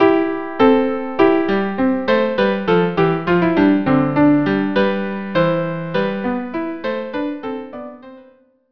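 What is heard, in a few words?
violin viola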